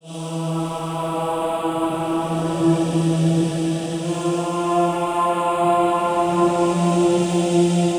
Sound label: Vox loop (120BPM); cinematic drama; Loops; musicradar